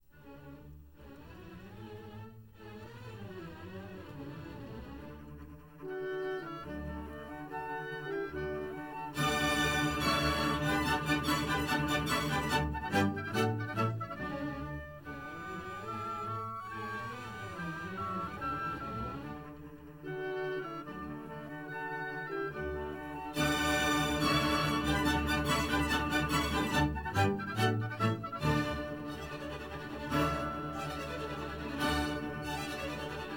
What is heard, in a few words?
Stereo Recordings, ORTF; Mazart Nozze di Figaro